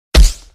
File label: punch